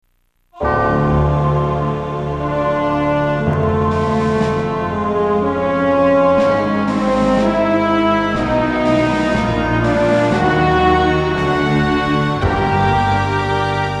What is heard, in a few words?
sea intro; Indiana Jones and the Infernal Machine